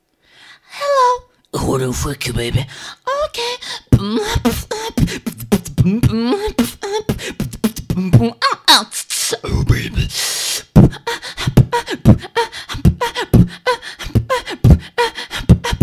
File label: wake up bbox